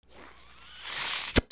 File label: CARTOON